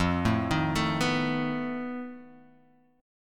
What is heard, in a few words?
F 7th Flat 5th F Guitar Half Step Down